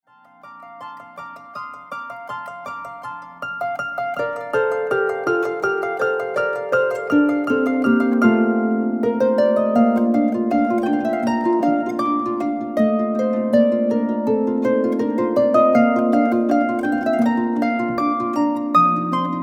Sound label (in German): Sonata B-Dur, Harfe; Klanghaus Eibelstadt